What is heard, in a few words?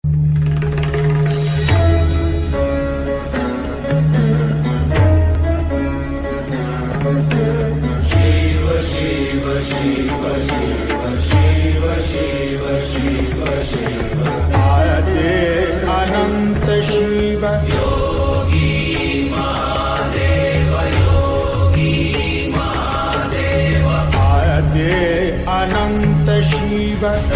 shiva mahadev ringtone